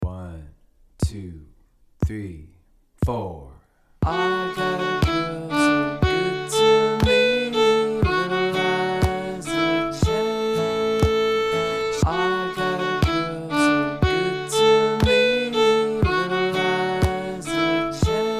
Fiddle; Little Liza Jane; beginner fiddle lessons; the d major scale

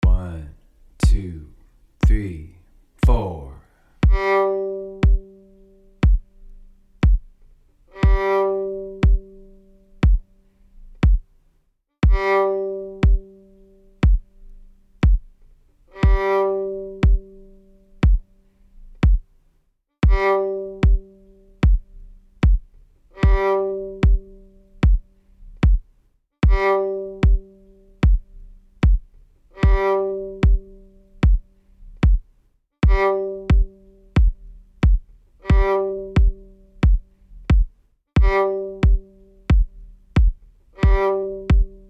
throw away bow G, fiddle

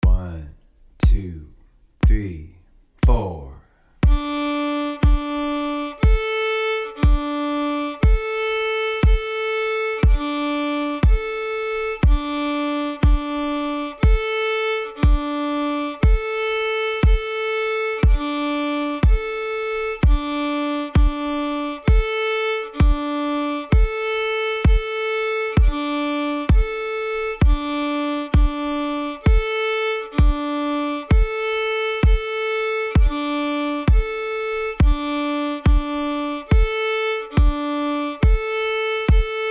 peri diddle D to A, violin

